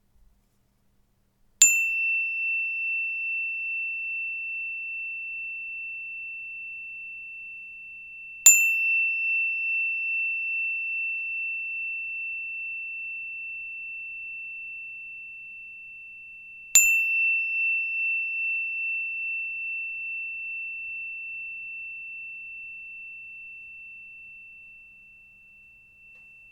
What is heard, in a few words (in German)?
klangstab hoch e